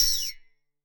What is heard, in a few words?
Tech Drum Hit, techno 120bpm; musicradar; Drum Hits